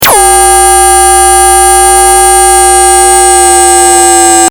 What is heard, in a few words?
fm synths